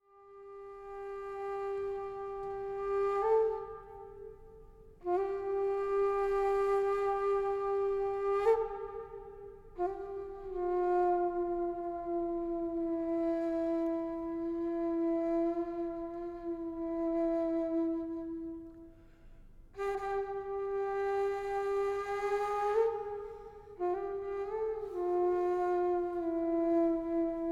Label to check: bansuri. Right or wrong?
Right